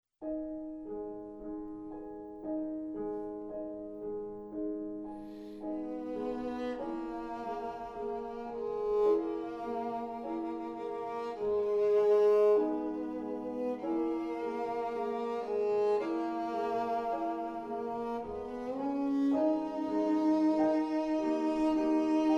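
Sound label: violin sonata; catoire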